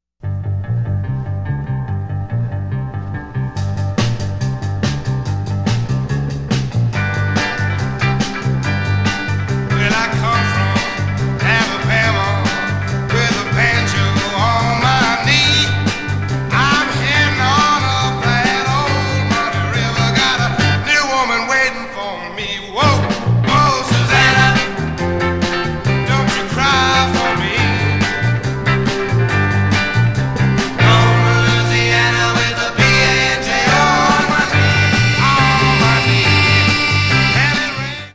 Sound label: the banjo song